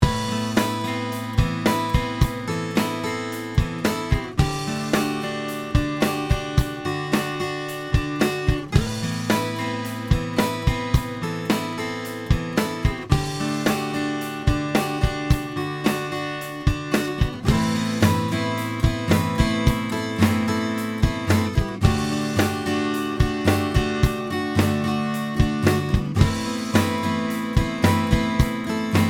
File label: antelope mic pre